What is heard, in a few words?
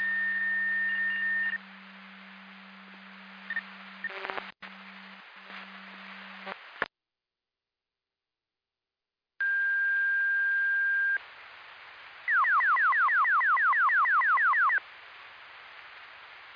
Siren; Hamburg FD